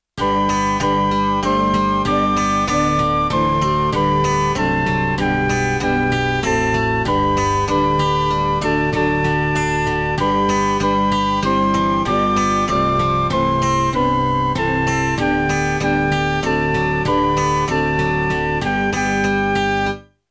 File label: Joyful joyful we adore thee